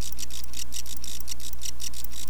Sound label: buzz